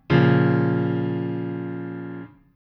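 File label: A sharp m lowest, chords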